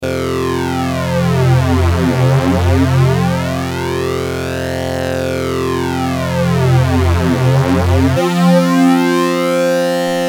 prodigy ibanez fl99

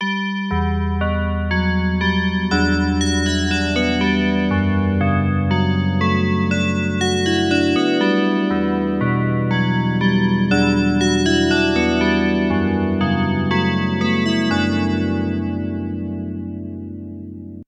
DX7 bells, pitch shift doubler

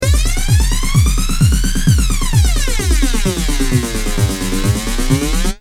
drum loop DOD 670

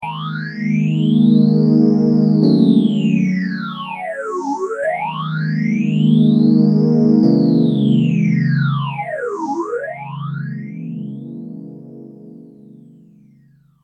m1000 boss ph3

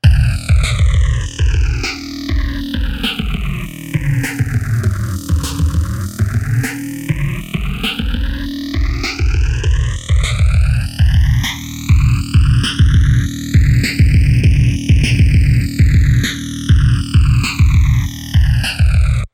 moogerphaser

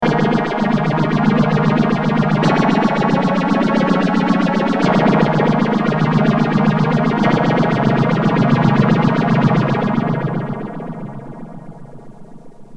phasor; dod

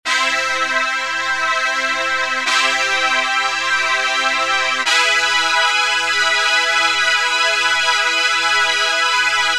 jd990 brass clone theory

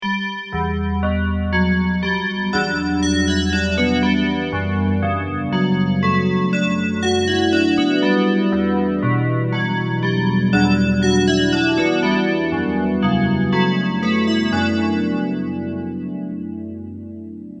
DX7 bells, Rockman stereo chorus

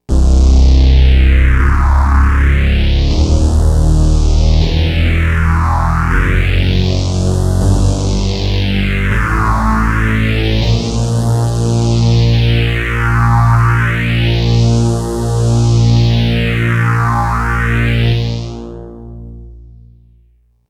octa scale tcxii re

